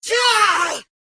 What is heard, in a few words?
Mob Voice Attack; Bad Brawler